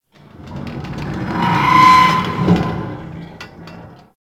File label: Ice Metal Groan; Warframe; Corpus Ice Planet; Ambience